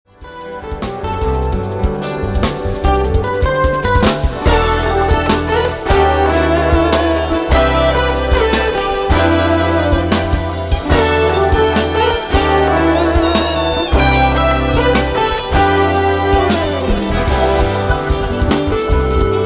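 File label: trumpet solo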